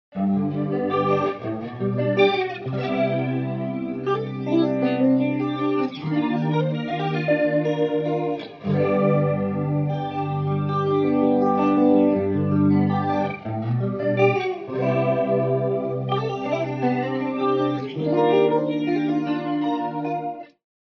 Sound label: Swirling Chorus Guitar Wet; Vox Quantizer; syntheway